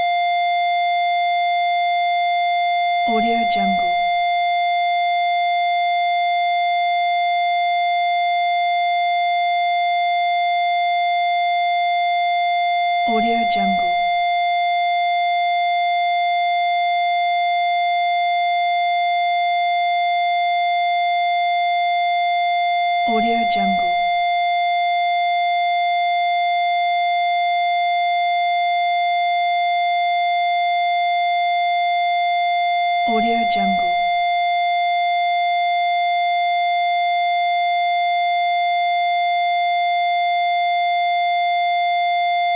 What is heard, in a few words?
test tone square wave; prosoundfx